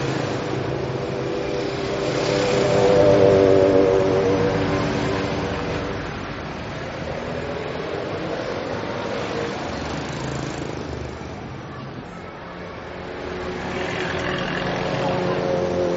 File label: noisy street